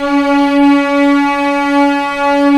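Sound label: arco cello; Vcs5 Arco nv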